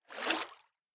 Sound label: oar click